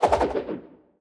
death sickle start

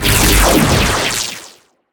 laserring strong

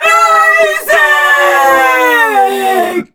vox death

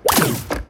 charged baby battery

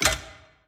notched axe

